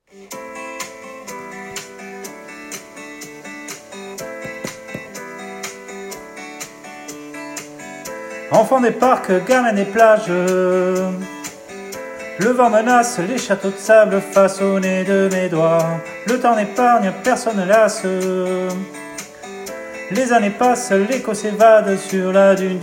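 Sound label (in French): chant de sirènes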